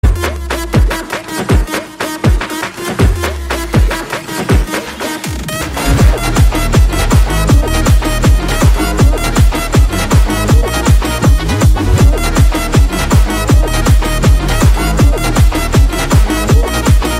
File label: Bgm Ringtone